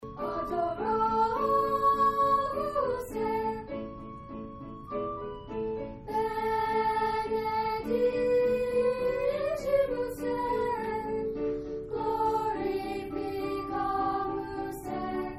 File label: Choir